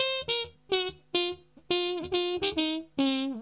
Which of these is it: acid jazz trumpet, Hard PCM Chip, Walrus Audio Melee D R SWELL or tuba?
acid jazz trumpet